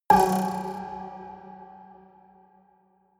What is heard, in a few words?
haunted strings buzz high; hits explosionen